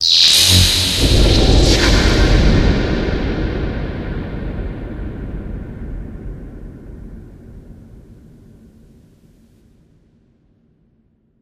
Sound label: distant displace